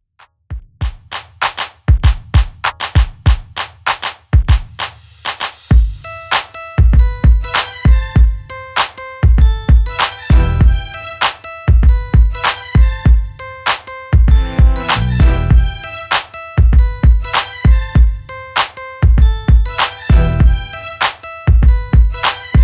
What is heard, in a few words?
Hiphop DJ Friendly Redrum 98bpm DIRTY